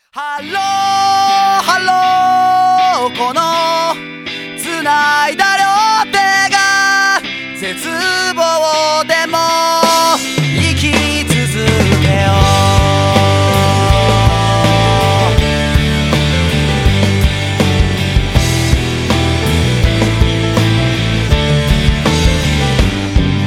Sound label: music